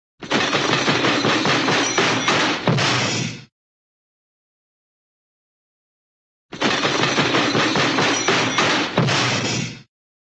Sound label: train, cartoon crashy train stop